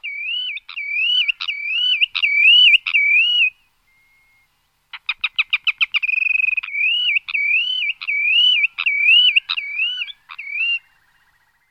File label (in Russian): schegol